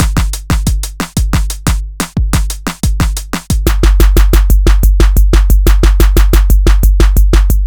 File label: Groove Pizza 90bpm